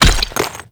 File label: Stone Pillar Debris Hit; Ambience; Warframe; Duviri; Murmur